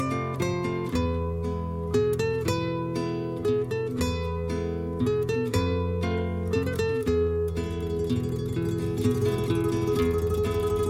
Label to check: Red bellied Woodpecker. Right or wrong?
Wrong